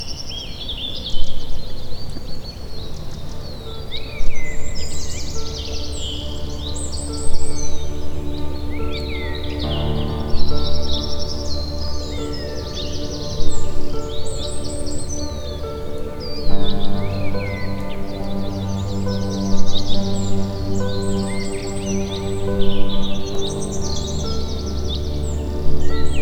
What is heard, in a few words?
birds